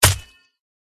iguaron attack contact middle

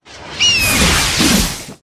Hawk; yastreb

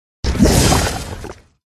jelly fish attack voice bottom

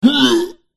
chaos titan wound

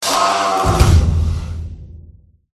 death; orluphia

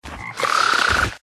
Pet Toad

